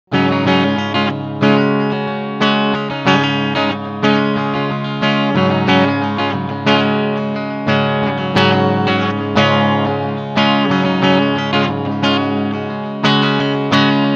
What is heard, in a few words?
clean strumming stegpickup